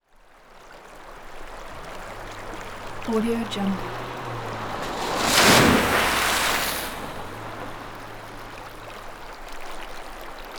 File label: vehicle pass by through water